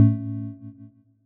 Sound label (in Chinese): 远程挂断